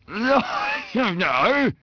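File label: male angry; People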